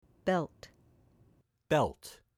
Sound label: Belt